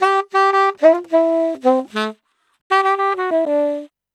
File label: Sax 100 bpm G, Saxaphone; Quazibeat; African Secret